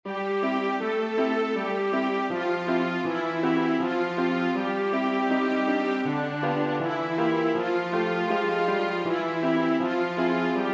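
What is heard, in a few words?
London Bridge is Falling Down with Piano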